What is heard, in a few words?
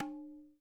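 TABLA right; WorldSounds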